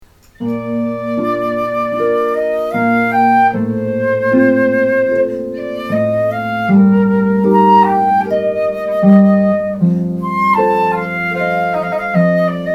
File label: Flute Harp; Arioso